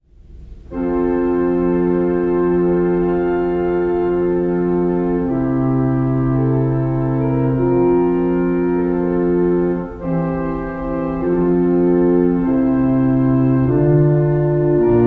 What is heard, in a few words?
cadences